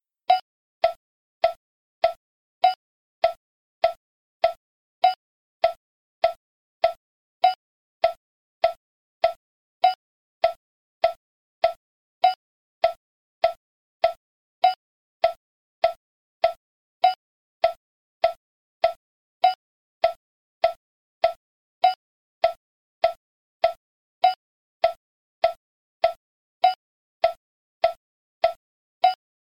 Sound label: 100 bpm, quarter notes; fundamental practice